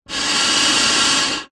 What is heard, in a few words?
human blowing air into plastic tube